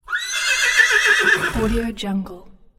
horse whinny and neighing

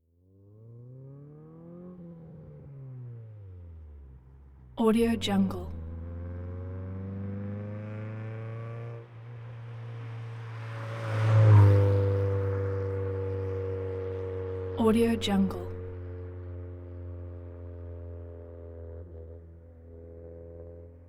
street race car medium pass by with long away

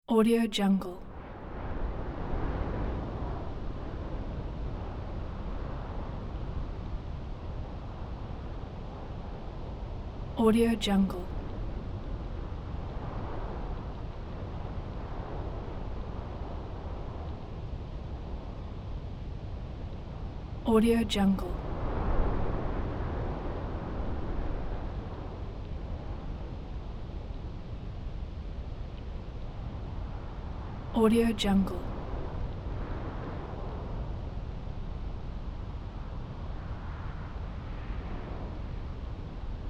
wind in mountains

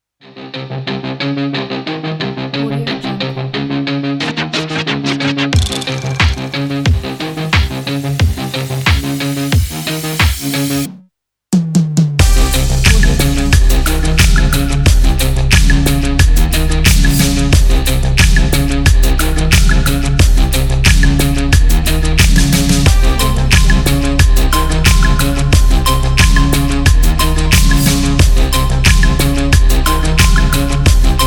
cyber waves

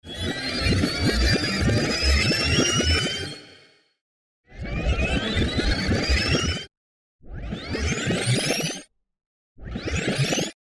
rewind

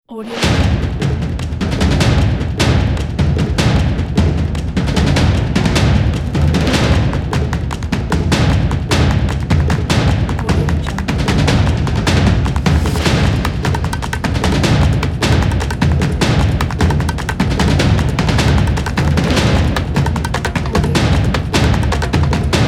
the drums logo

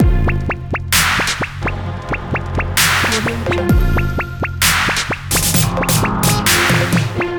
65 bpm gorilla trap; soundmorph

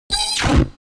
science fiction space jammed laser beam

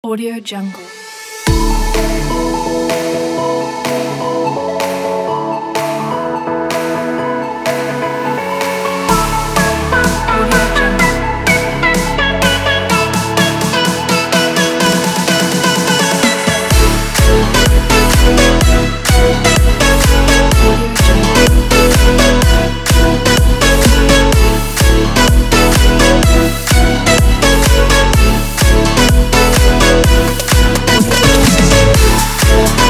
upbeat positive pop

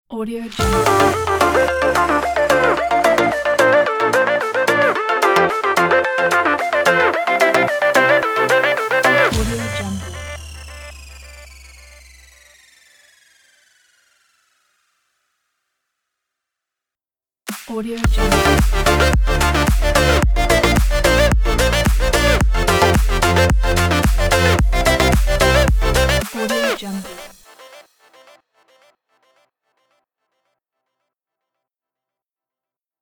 pop edm opener logo

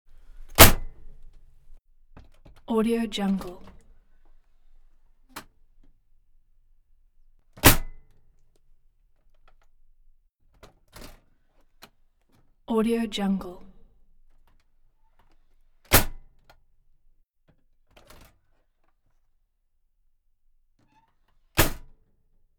trailer door metallic snappy open close

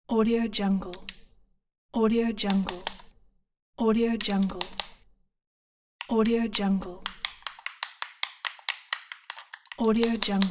gamepad

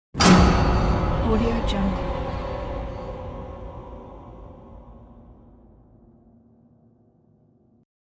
horror jump scare; josiahjohnsonstudios

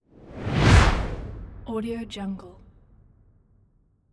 a big whoosh

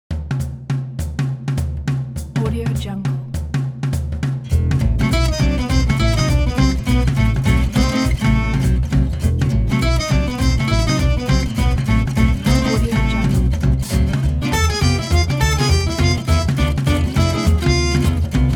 the swinging tune; remstunes

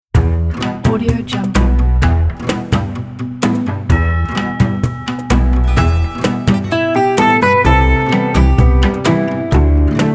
folk european

